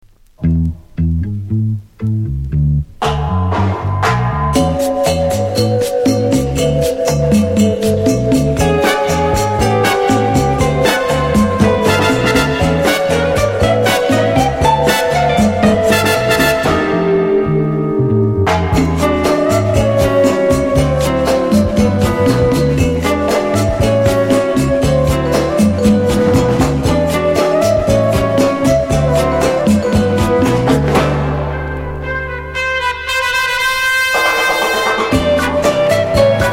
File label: LATIN SOUND